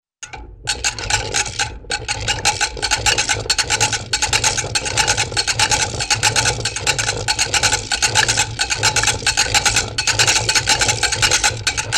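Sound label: Funny Slow Hard To Get Going Engine Steady with wooden, cartoon; Hollywood Edge; Cartoon Trax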